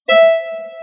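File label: guitar synth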